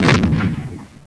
medium gun, weapons

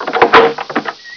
door open

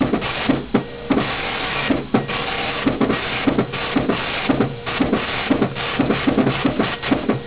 dot matrix, computers

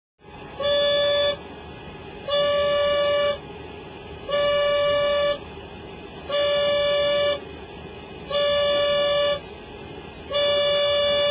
cockpit warning, aircraft

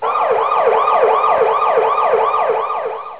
ambulance, sirens

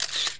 camera, household

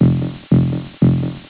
electronic heart

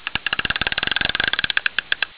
fishing reel

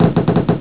flashbulb

